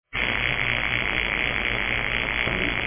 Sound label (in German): bulova, armbandwecker